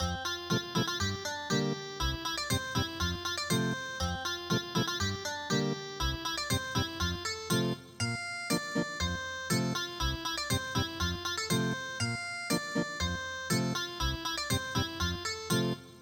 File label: Ethnic Guitar; Holdiridia